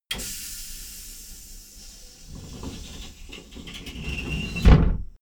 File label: train intercity train hydraulic slide door close